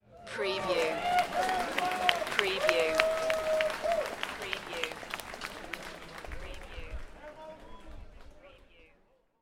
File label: Applause with Cheering